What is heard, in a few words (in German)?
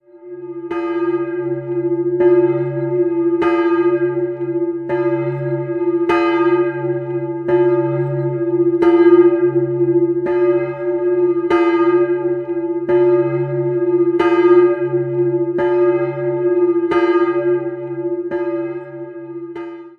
Fichtelberg glocke